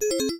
escape; BALLY; sfx; TWILIGHT ZONE; Pinsound; Twilight Zone Reorchestrated

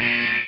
alarm; BALLY; CORVETTE

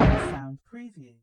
clank; BALLY; sfx; TWILIGHT ZONE; Pinsound; Twilight-Zone-Full-Reorchestration

